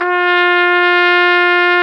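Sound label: trumpet, jazz; Roland L-CDX-03 Disk 2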